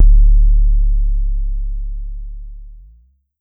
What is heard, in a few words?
Pollard Syndrum Sub